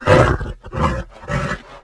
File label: Mutant idle; lmctf